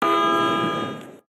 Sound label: Horn